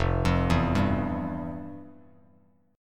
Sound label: F Major 7th Suspended 2nd, Bass Standard; arpeggiated; FM7sus2; 1,8,2,0; JGuitar